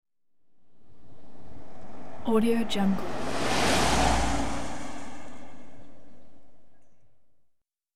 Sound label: car pass by